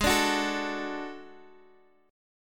D Major G sharp, guitar; D/G# Chord {X X 6 7 7 5} strummed

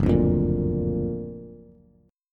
Gb Augmented Major 7th, down, Bass; Gb+M7 strummed; JGuitar

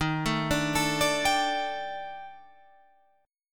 D sharp Major 7th Flat 5th, down, Guitar, Drop D

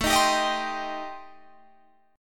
A Minor 7th Flat 5th A, Guitar, Standard; Am7b5 strummed; 17,18,17,20,20,20

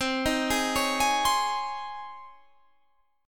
C sharp Major 7th Suspended 2nd, C

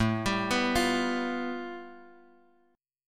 A 7th Suspended 2nd Sharp 5th, Guitar, Drop D; 7,10,9,10,x,x

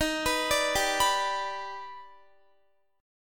Eb Augmented Major 7th Eb, Guitar; arpeggiated; x,18,21,19,19,18; 4E,4A,5D,5G,5C,6F

